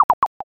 dice

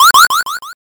warning

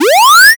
charge

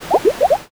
poison

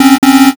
wrong

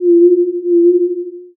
owl

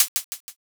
hihat

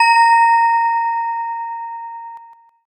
handbell

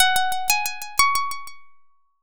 koto

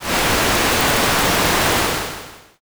fire

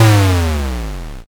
explosion m

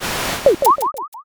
gacha roll

